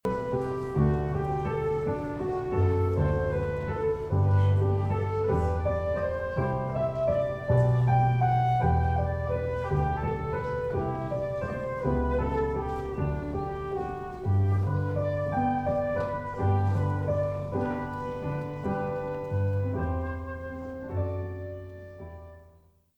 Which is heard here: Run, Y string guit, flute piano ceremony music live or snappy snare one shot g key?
flute piano ceremony music live